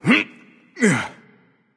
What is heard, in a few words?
grunt jump; smite; voicepack; ravana